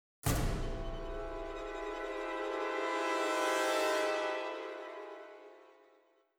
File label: orchestra cluster; Netease; gank